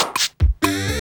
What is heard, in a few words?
DRM OLD, dance synth